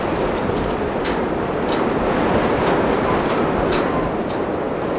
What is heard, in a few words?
wind metal loop